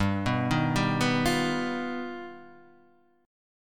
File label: G Augmented 7th; G+7 arpeggiated; Standard; JGuitar